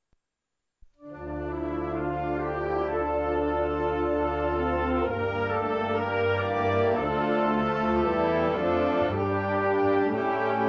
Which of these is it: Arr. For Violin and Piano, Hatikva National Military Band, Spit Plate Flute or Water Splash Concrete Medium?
Hatikva National Military Band